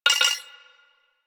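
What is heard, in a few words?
Hub Computer Beep; Warframe; Tenno Hub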